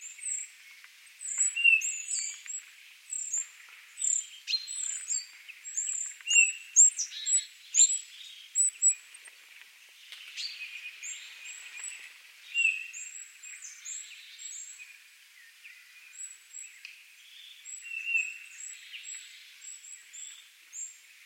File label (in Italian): Ciuffolotto calls, Fringillidae; Passeriformes; Giazzamarzo 2012; robertolerco